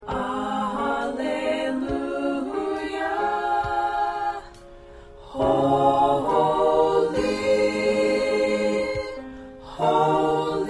SATB; Agnus Dei SATB

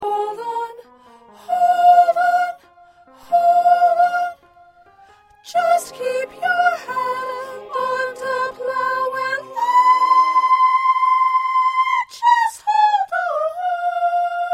1st Soprano, choir parts

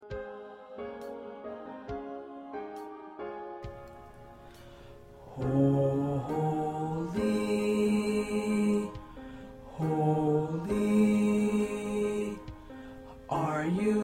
Bass; Agnus Dei SATB

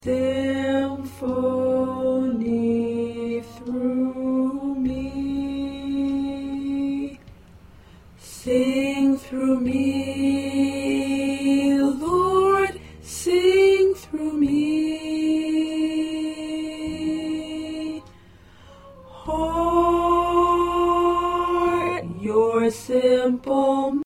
Tenor, choir parts

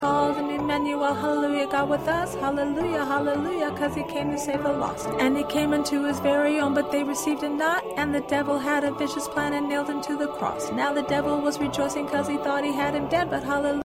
Ensemble Voice 3

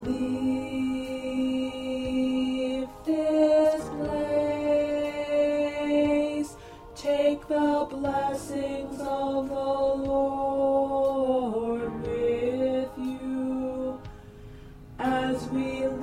Tenor

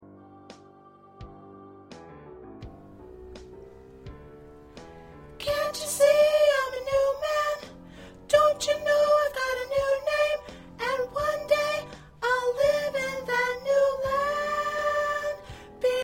Soprano, choir parts

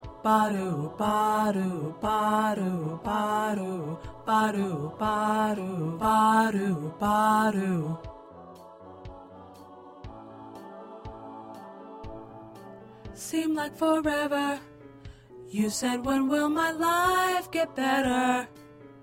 2nd Tenor

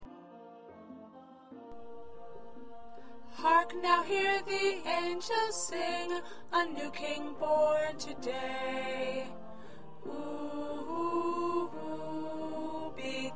Alto, choir

